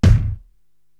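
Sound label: BD Rock, bass drum, drum machine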